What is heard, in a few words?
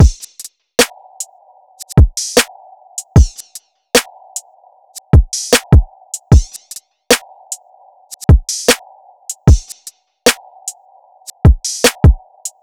Defendant Drum Loop, 152 BPM; Cymatics; Vibes II Drum Loop Collection